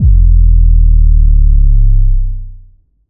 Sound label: phonk kicks oneshot - 808 e; kick drum